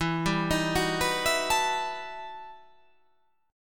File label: E Minor Major 11th E